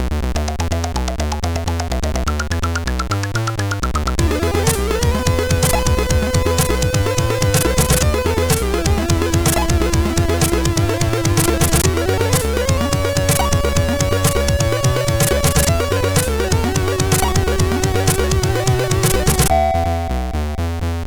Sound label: intro, sid CSG8580R5